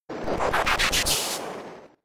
Sand Tomb turn damage; Pokemon; Gen 5; Blk Wht Blk2 Wht2

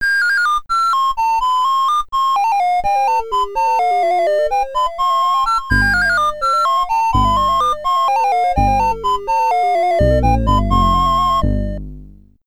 Continue SID Stereo; Ghouls n Ghosts; Commodore 64

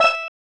Tadpole Melody (Mi); super mario rpg 1996 snes

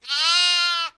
bah

